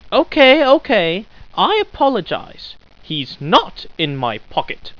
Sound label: ahh, scientist